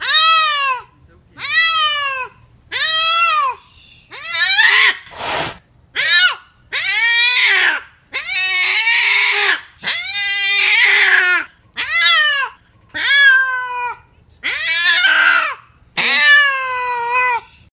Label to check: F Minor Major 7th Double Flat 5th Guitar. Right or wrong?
Wrong